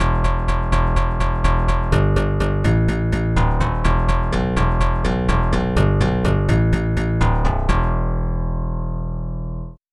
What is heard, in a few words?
slap bass bass